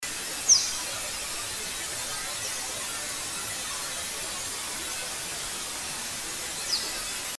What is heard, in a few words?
BPA USV Calls Lowered By 10 CLEANER